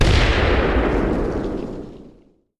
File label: mortar expl; weapons